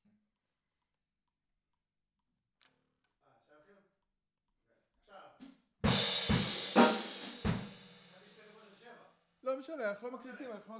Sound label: TB GTR